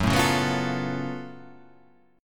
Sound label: F sharp Minor Major 11th